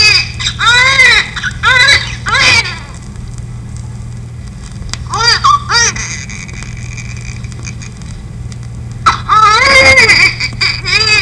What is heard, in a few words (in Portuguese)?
prematuro, som pediatrico; RN TERMO PREMATURO; SONS PEDIÁTRICOS; PEDIATRIA UFF